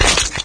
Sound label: Rat Death